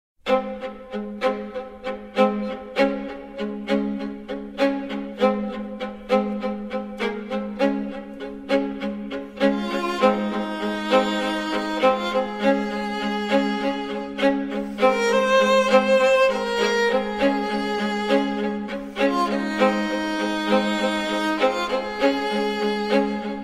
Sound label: string quartet; irish pop rock; pop rock venu d’Irlande